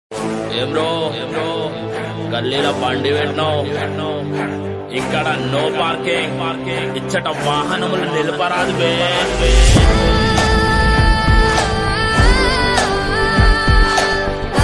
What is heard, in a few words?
Rap Ringtone